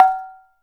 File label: CANANG F#4; Spectrasonics; Heart of Asia